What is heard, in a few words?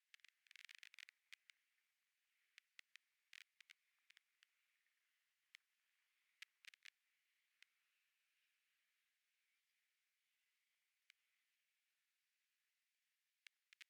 DRONES